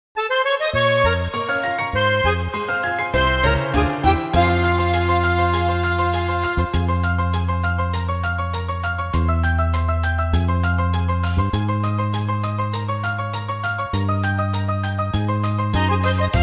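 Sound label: minus F minor alto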